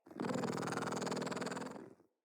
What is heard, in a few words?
Kitten Growly Purr; Catbrow